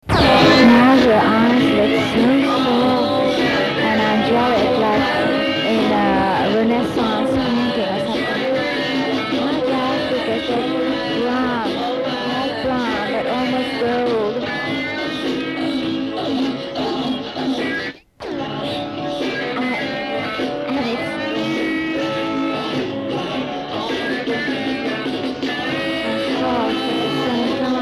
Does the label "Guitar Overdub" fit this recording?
Yes